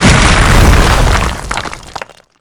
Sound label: wind tremor stop